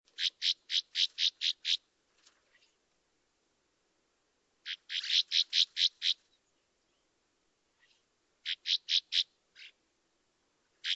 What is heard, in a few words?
Nothern Rough winged Swallow